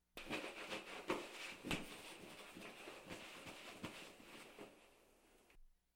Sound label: Wiping table with cloth